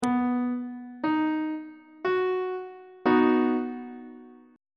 c flat major chord on treble clef

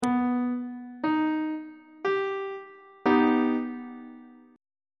c flat augmented chord; on treble clef

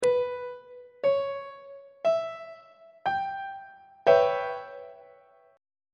c sharp half diminished 7th 3rd inversion; treble clef

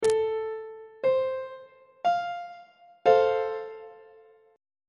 e sharp major 1st inversion on treble clef

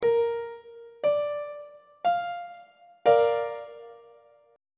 b flat major chord on treble clef

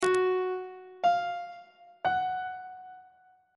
g flat 8th intervals on treble clef

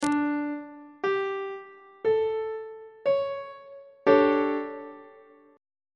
d major 7th suspended 4th chord on treble clef

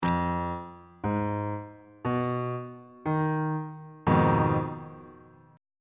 g sharp minor 6th 3rd inversion on bass clef

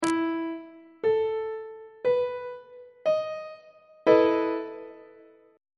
e major 7th suspended 4th chord on treble clef

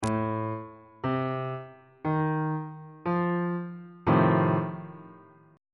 f dominant 7th 1st inversion on bass clef

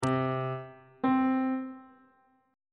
c note on bass clef

